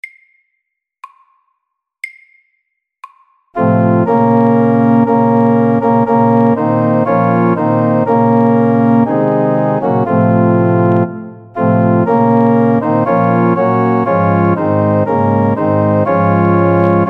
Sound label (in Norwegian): Marche funebre MIDDELS